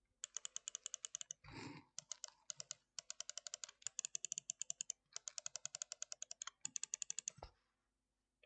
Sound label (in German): Seitentasten